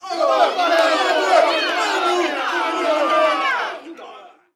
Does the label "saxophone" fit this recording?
No